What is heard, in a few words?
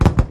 pop, fireworks